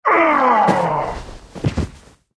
dark archer dead, monster

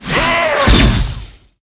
dark warrior attack, monster

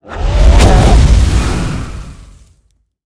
boss, monster

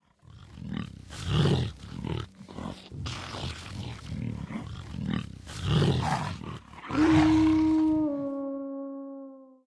werewolf idle, monster

